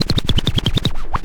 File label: scratch short, Vinyl scratches